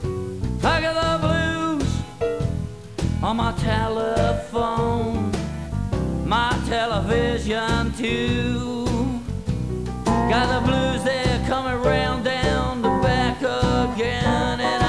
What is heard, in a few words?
the blues